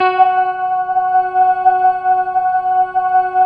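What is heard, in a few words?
synth Morpheus; Sound & Vision - Gigapack II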